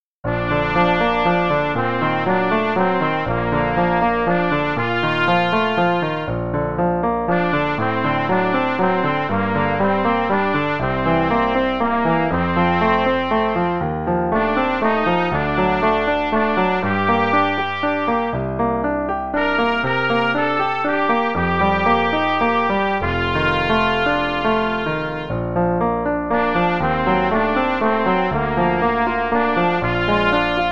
Up the Wooden Hill (no click, dh=40), Trumpet; The Really Easy Trumpet Book

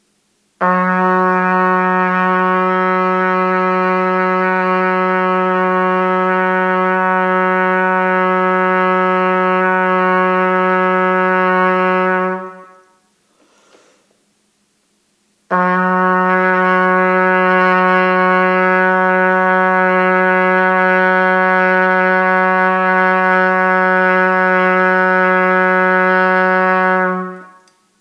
Long Tones Low Ab, trumpet

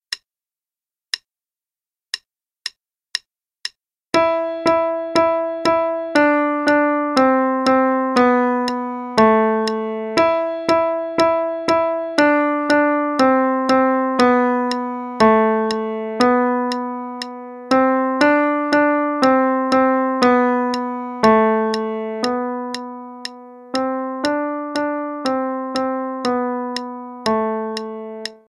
Russian Folk Song, qn=120

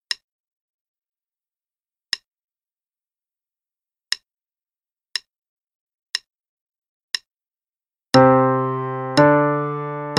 5 Finger Pattern in C then G (2x each, qn=60)

